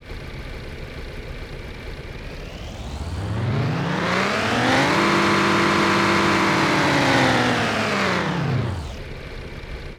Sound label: sports, engine sound